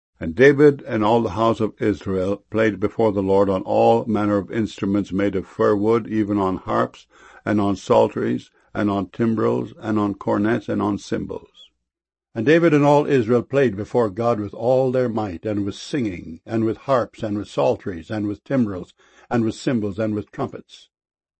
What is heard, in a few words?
cymbals